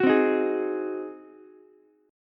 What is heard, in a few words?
Ab 7th Ab down Ukulele; Ab7 strummed; JGuitar